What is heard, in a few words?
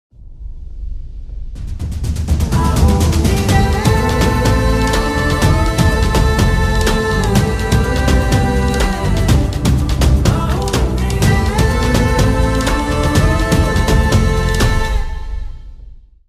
Promo Bgm Ringtone; RadheShyam